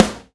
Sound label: Snare